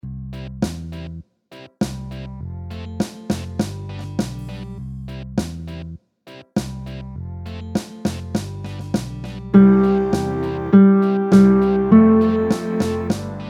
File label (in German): reaggy fuer gitarre